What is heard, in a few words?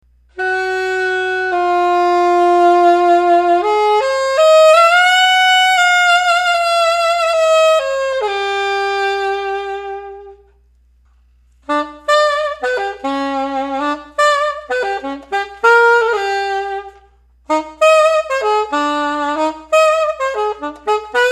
meyer, alto; silver; super; Super46